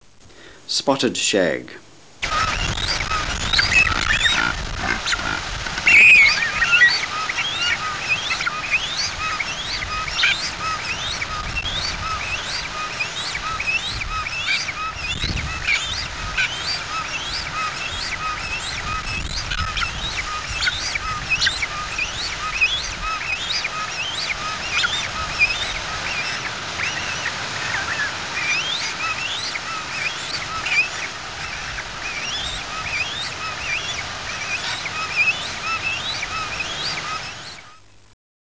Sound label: Spotted Shag